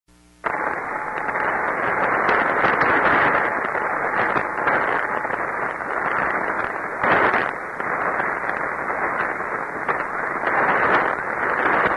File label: Beacon, Daytona Beach FL